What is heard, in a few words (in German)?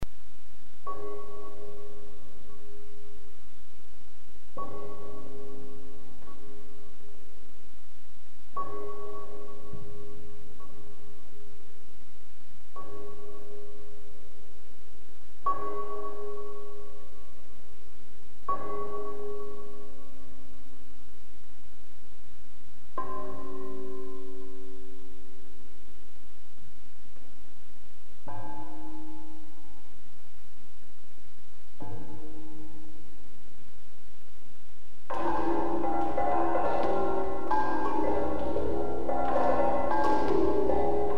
Glocken, Klangstelen